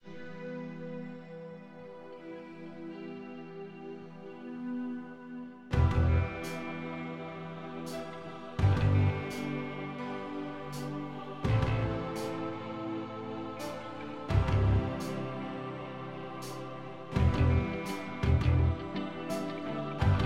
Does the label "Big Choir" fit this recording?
No